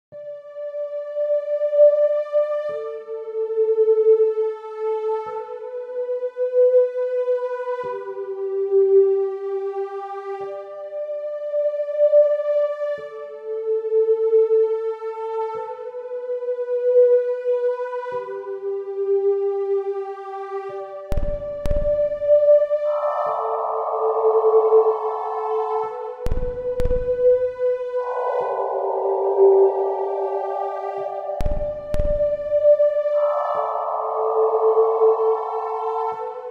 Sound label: Peaceful Ambience - Ambient song